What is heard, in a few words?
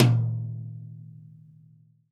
TD-20 Tom A, Roland TD-20 Toms